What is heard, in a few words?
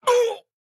town human road hit; Blind Drive